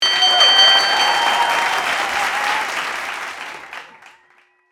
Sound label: win sound; powerball evo